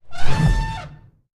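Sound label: elephant small; Battle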